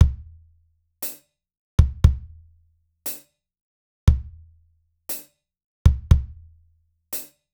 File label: 118bpm perc